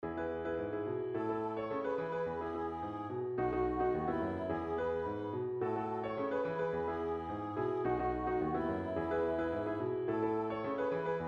Bows Exit Music

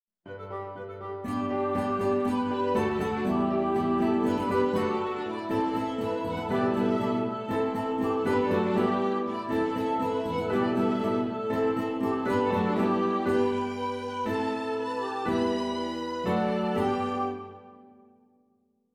ORCH; LaPouletteGrise

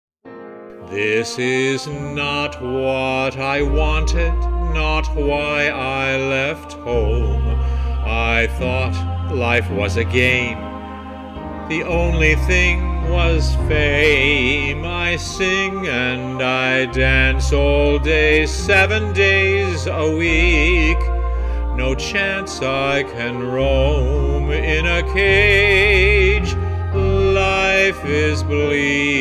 VOCAL

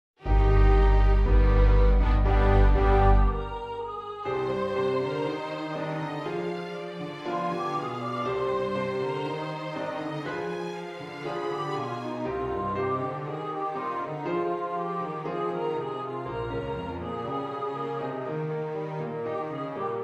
Everything PIANO

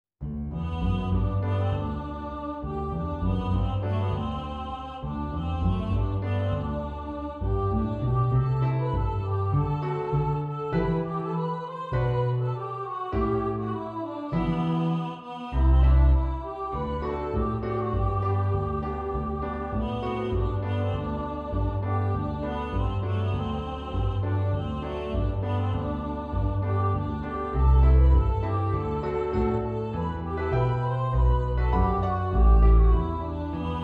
PIANO

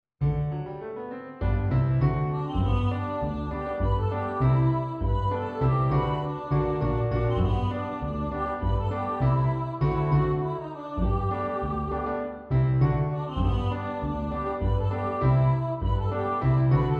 Finale Non Vocal

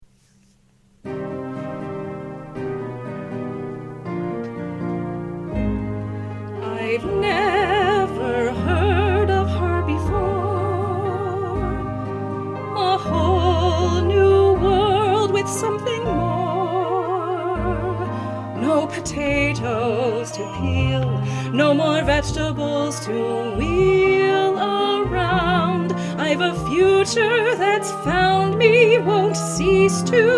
Key of Eb Vocal

